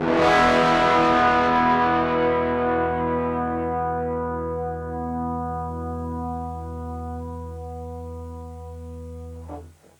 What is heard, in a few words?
amp squeezer; Four-voice stereo doubler.; tetraplopia